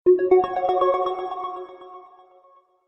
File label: Nice Text Message